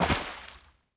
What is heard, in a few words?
body roll water, foley